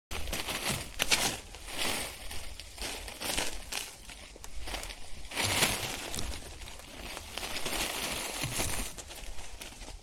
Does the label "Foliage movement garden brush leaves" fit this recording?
Yes